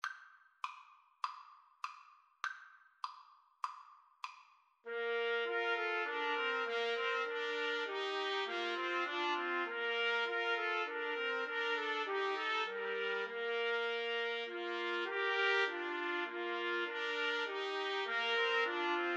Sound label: 3TPT, Trumpet Trio